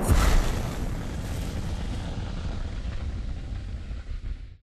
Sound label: boost generic end; Titanfall 2